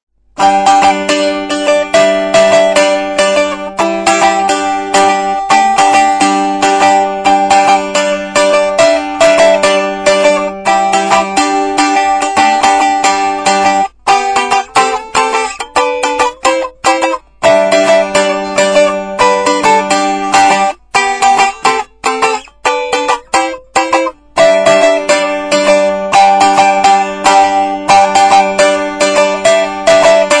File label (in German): strum, strumstick